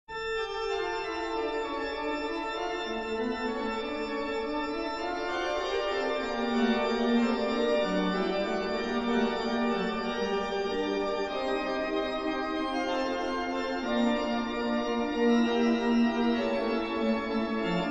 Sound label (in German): Fuge D-Moll